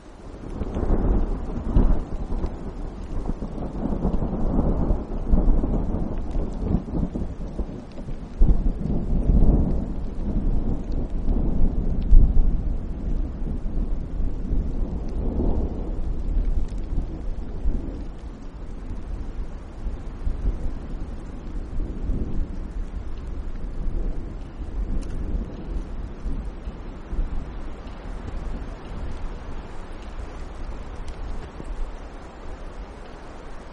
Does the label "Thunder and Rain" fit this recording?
Yes